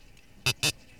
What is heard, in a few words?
Justitia japonica rasp